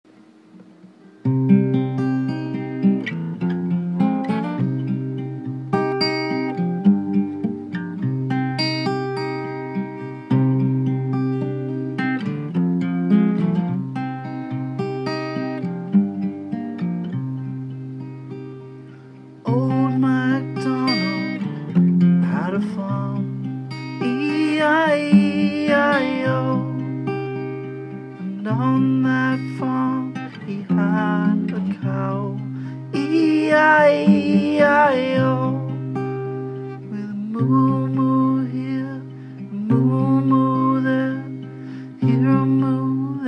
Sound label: Old Macdonald minor key